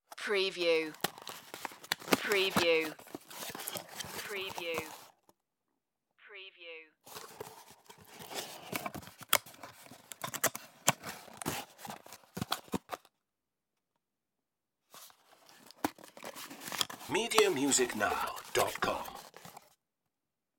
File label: cardboard box